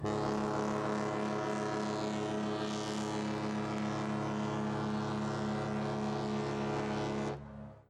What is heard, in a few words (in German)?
Schiffshorn; Ahoi-Girls